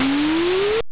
block move; svencoop; skillcourse

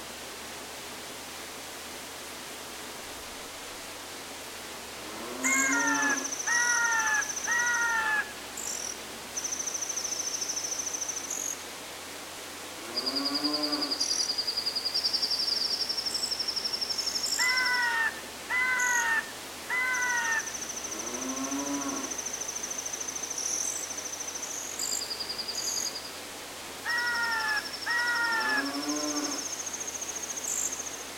night loop; zelda

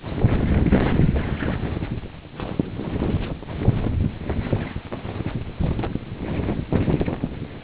flap, ambience

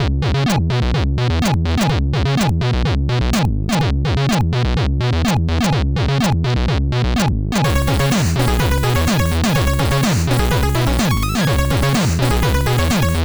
music

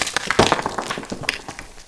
bite debris; renesaur

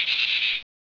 cricket idle; gearbox

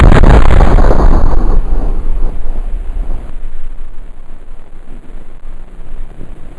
usas fire; weapons

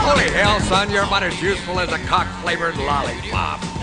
pop; dod

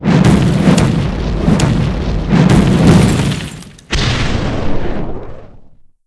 firestart; svencoop; keenhalloween

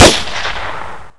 machinegun fire; svencoop; paranoia; weapons

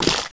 mini spider crush; tarantula; svencoop

